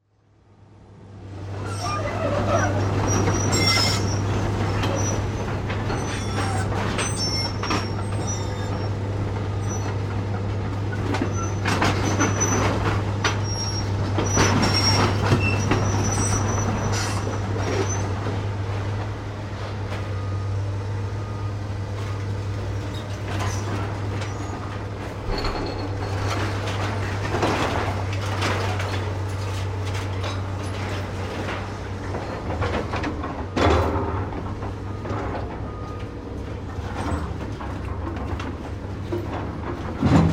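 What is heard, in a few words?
demolition of a building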